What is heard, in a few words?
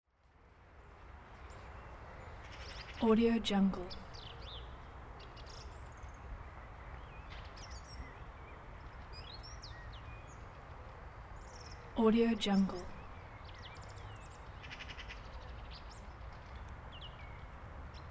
park city ambience